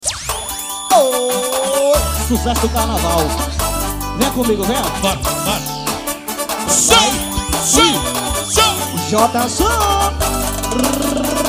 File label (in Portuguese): ao vivo forro